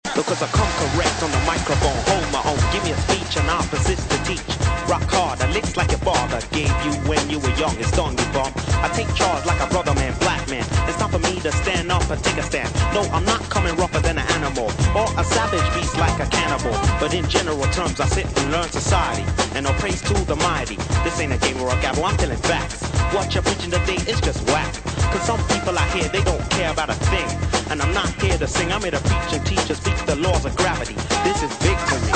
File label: intro rap refrain